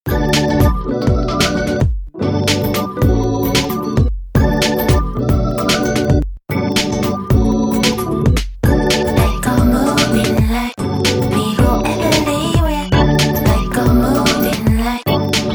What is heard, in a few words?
Organ Mix; (organ remix)